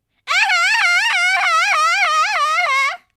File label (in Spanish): risita